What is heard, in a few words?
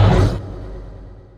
ion shell stop; weapons; dota; dark seer